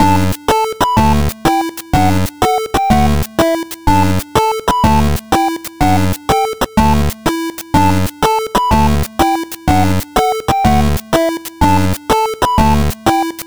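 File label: A little disappointed, ショートループ 失敗 おふざけ チップチューン ファミコン風; ちょっと残念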